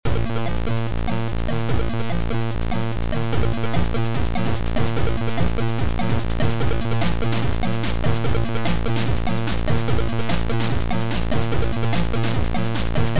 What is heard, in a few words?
TRANCE; DNK